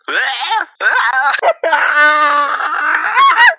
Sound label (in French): gemissement de l'ogre